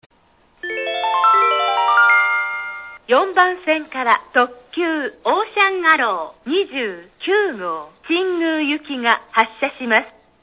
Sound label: wakayama track 4; nishinihon; ocean arrow 29go